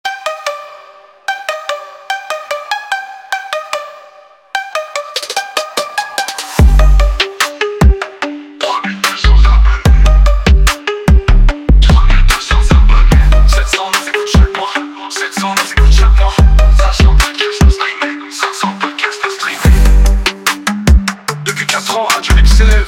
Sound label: promos songs Trap; Radio Libre